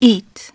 EAT; Dorling Kindersley My First Incredible Amazing Dictionary; HAWK

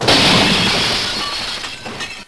Crash; FWB Stack

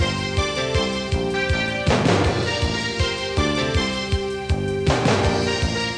Musik Loop; TKKG 2

